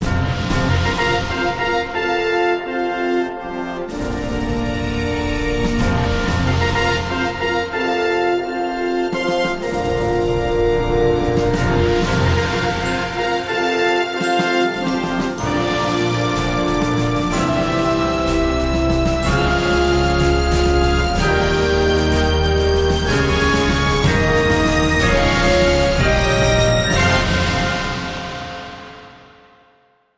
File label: Ceremonial Fanfare